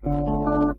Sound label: power unplug